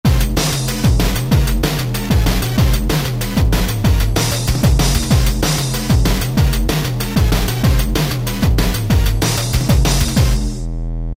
Steppa, Korg ES-1; Factory Patterns

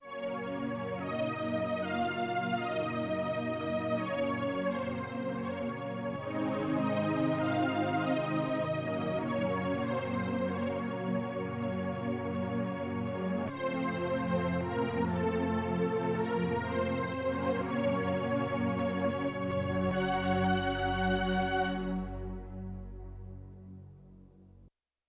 Lush Syn-Strings; Yamaha TX16W

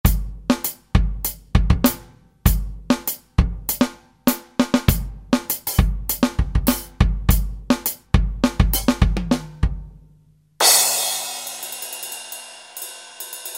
Economy Kit, Real Drums; Yamaha A4000; Kits

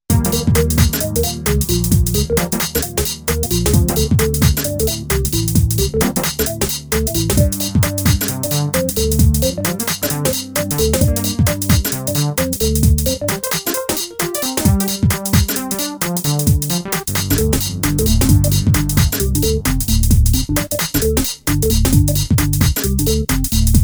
Groovy Garage

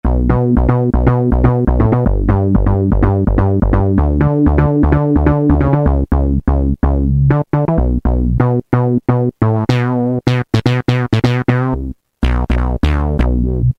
System 100m; Roland M-DC1

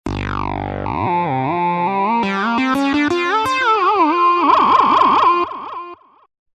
SH-101 Solo OD, Roland V-Synth; Factory Patches

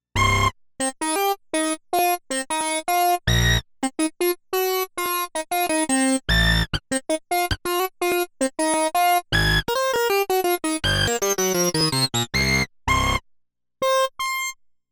Orient Unison

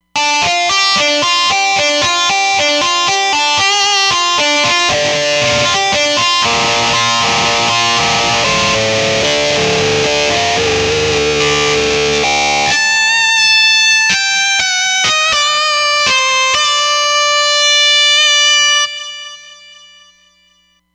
talking mess

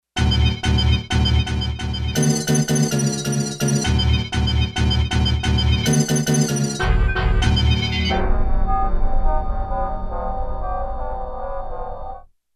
Gated FX; Digital Synthesizer

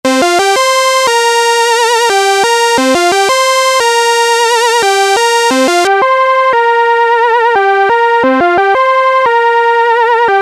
Dual Saw

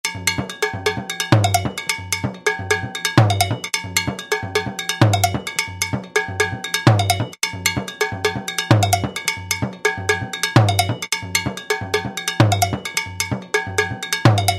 MISC. LP. 130; Best Service Alpha Dance II

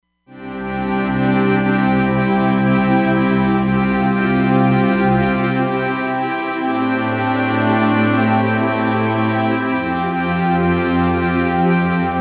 Swap Pad, Roland Fantom XR

